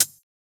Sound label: Closed Hihat, Cymbals; Closed Hihats